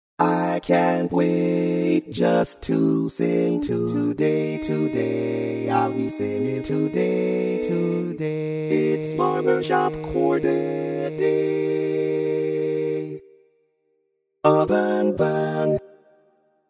Bass, barbershop tags

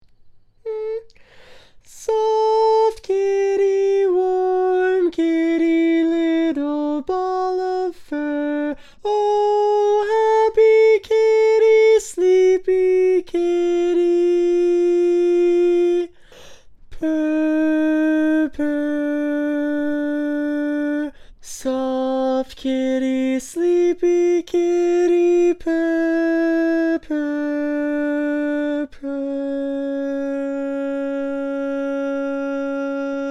Tenor, Barbershop

